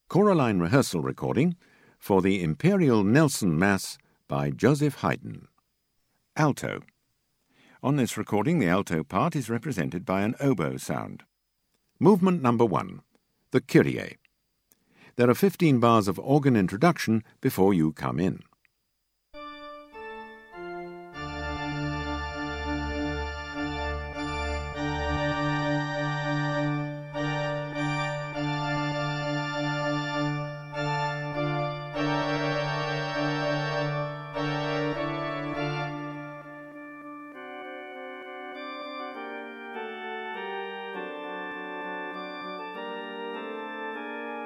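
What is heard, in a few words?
Alt, alto; Haydn Nelson Mass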